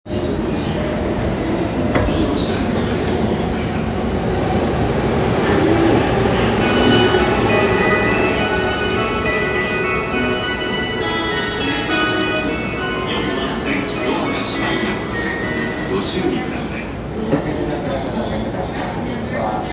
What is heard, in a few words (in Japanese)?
中央線快速吉祥寺東, train sounds